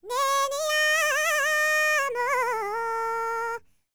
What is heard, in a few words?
BULGARIAN, World Voices, BALKAN